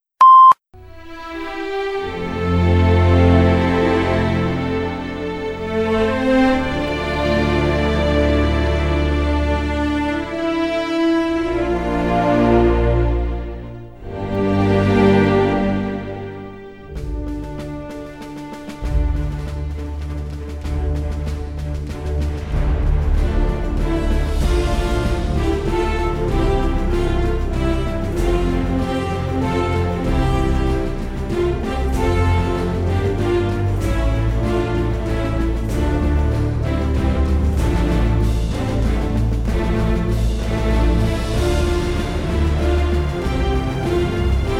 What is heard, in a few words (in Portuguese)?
Musica Pares Masculinos